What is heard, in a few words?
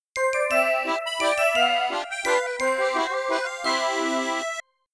Greeting Message; Wii Room (Theater Update) Soundtrack